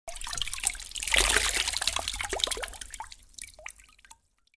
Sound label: Squeezing sponge into water